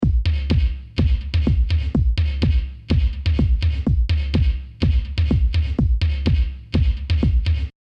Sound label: House drums Dry